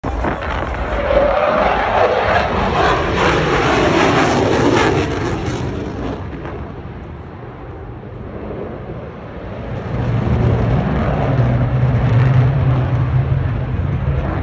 Chengdu J 20; جت جنگنده چینی

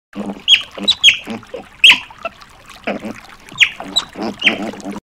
Eurasian otter; شنگ اوراسیایی

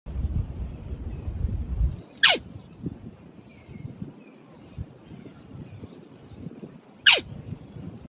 quokka; کوئوکا

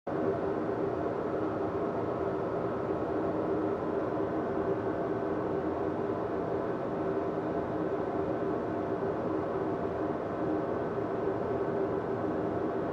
basement

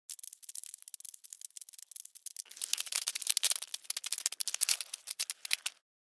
Tarantulas

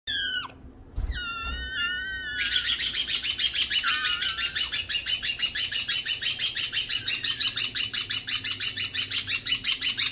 Green cheeked parakeet; طوطی گرینچیک

